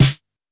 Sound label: Electro snare; Reference Beat